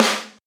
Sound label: trap snare drum sound a key, acoustic snare sound tuned to the A note; snare-drum-samples